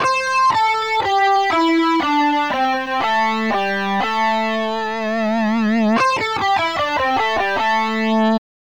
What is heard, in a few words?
Pentatonic Scale one octave only